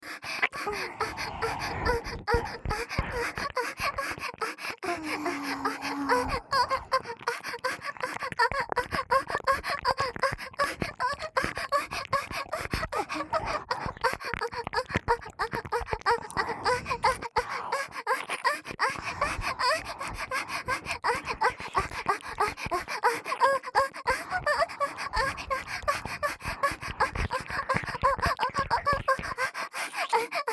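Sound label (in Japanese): 激しい演技喘ぎ＋片耳舐め; 美冬; 小春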